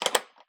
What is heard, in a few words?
Item Lock; Idle Champions of the Forgotten Realms